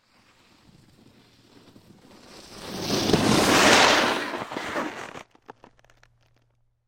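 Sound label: snowboard sounds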